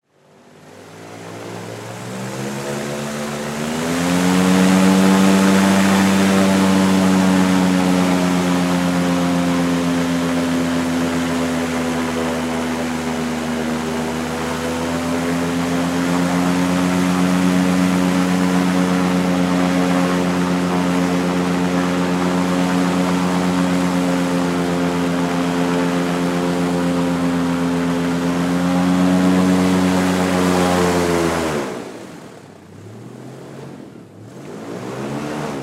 Air Boat Fast Accelerate Power Stop; Transportation Watercraft; Boat Sound Effects; Blastwave

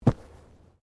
Bodymovement Fall Light

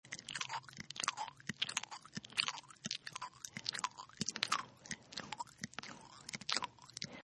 Chew Gum; Eating; Human

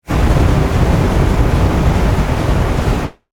Rocket Booster, Scifi, Descend, Off, Burst, Flame; Science, Fiction; Spacecraft Sound Effects

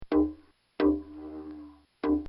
Dumbecki, drum; Drum Sound Effects; Musical; recorded in a custom built sound booth

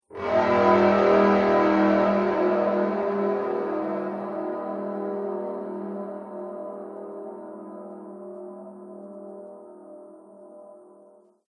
Psycho Suspense Transition; Transition Sound Effects

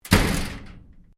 LOCKER METAL OLD DOOR SLAM; Old metal lockers; Metal Doors (Sound Effects:Doors)

